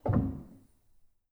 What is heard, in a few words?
rel, Grand